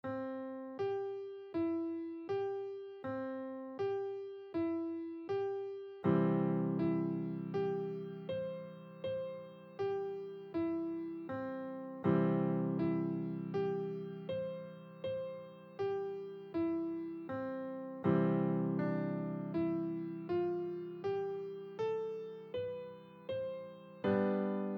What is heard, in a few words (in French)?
Des Gammes et des Arpèges Piano Débutant 40bpm; Les Aristochats